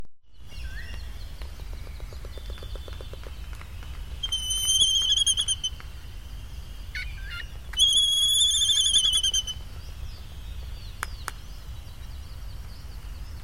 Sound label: Laysan Albatross in Rusty Bucket Ironwoods